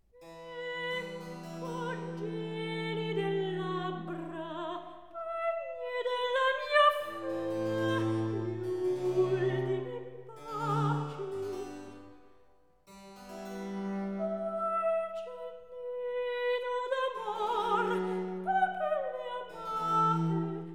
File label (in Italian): Recitativo; Ero e Leandro HWV 150; Ecco, gelide labora; Handel Masterworks; Klassika